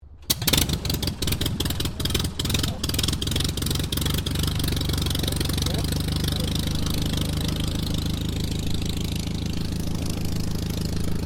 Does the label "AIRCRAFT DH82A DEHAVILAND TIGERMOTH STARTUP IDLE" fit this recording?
Yes